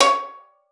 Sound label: C#5, VIOLIN PIZZ; Xsample VOL-5; 90 s Sample CDs